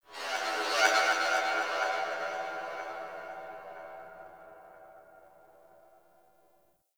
Sentient Harmonic Cries; Warframe; Tau

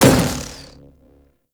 Shot Fire, Weapons; Warframe; Cedo Prime

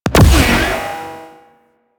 Space Fighter Plasma Shot A; Space Fighter Plasma Agent; Weapons

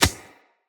Beach Ball Bounce Dirt

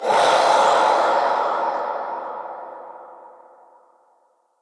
Ghost Death, Vocals; Characters; Enemy; Nightfold Test Range Ghosts

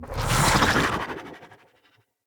Wazzard Staff Caster Proj Whizz By, Staves; Weapons; Player

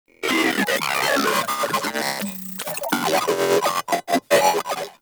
Moa Vocal Idle; Warframe

